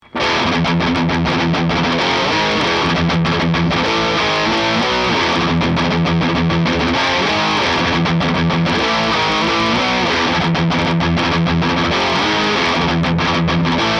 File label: alnico Magnet